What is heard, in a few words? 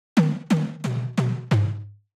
tom